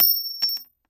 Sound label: Lockpick Drop; ThunderWire Studio; UHFPS; Puzzle